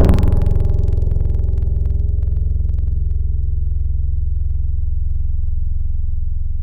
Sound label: Hit, Tropical Mallets